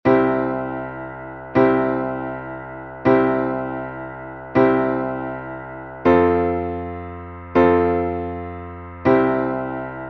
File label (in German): Akkordfolge Blues schema, piano